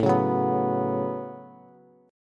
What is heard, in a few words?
A 6th Add 9th A down Bass; A6add9; strummed